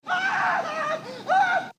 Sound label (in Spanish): grito